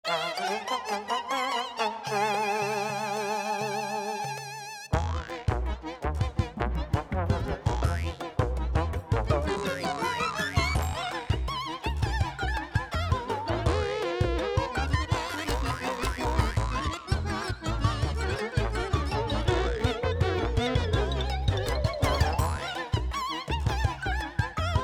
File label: BGM BTL; PAPER MARIO Color Splash; wiiu